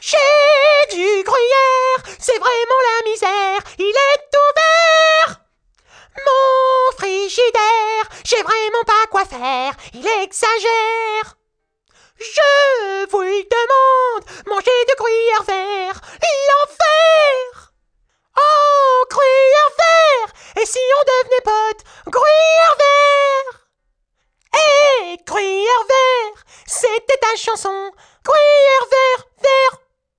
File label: pop; MOBICLIC 57